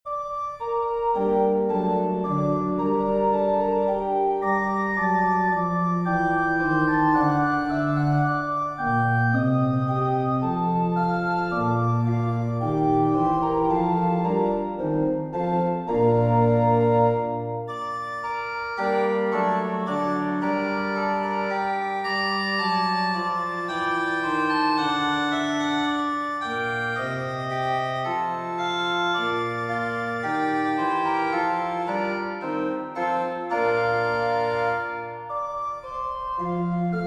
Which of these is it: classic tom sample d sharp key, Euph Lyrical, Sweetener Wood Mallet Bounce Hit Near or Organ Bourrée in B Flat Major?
Organ Bourrée in B Flat Major